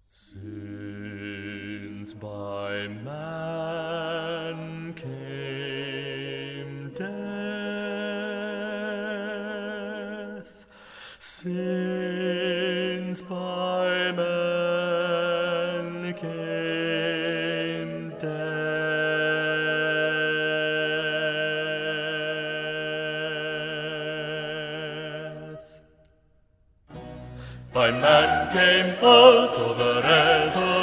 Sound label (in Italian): bassi; Since by a man came death; Messiah; handel messiah